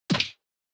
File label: Motion Grab Dry